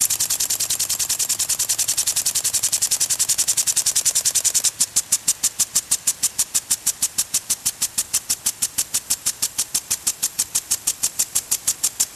Sprinkler Metal

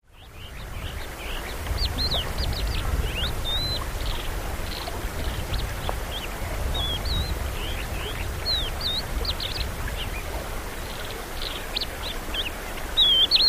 Desert Stream Water

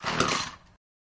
Chainsaw Pull Strt N; chainsaws

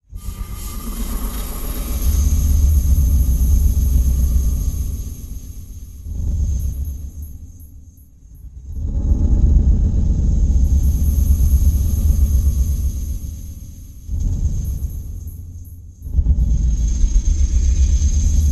Hydraulics Mechanical, metallic

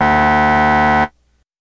Buzzer Elect Game S, electronics

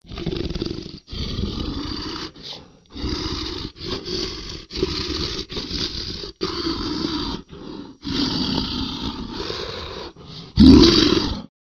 Monster Breathes, monsters

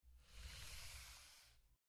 Short Mouse Slides

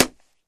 Boxing Glove Hit Slap

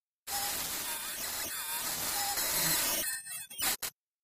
Static Space Sci Fi, communications

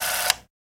8mm Camera Newsreel; shutters & movement

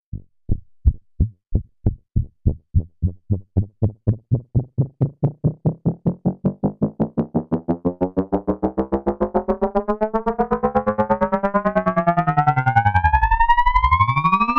synth efx rise

